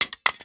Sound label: mag smg